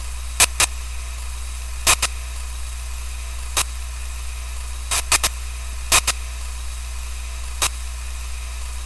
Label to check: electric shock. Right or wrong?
Right